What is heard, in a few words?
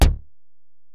EPerc, Alesis DM-PRO